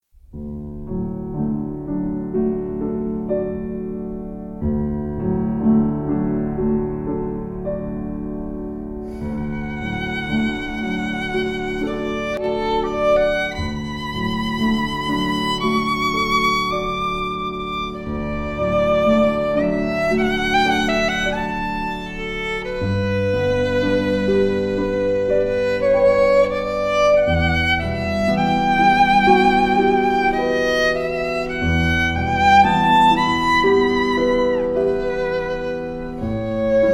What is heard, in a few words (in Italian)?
Duo, Meditation da Thais